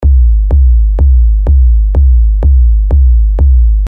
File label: Kick Loop F# (125 BPM); TECHKICKS1